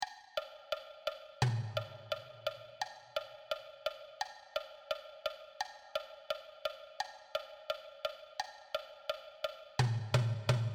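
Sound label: Percussion (Bass Drum, Gong)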